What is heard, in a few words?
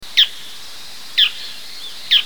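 bull-headed shrike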